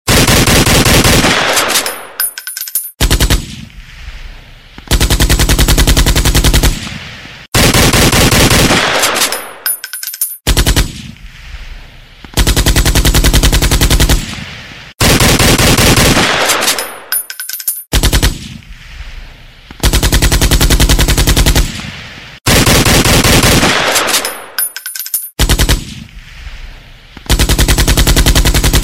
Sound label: ak47 vs 9mm, troca de tiros